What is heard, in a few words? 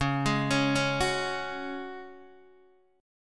Db Suspended 4th, Guitar, Open D